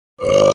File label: hackeeburp, reverse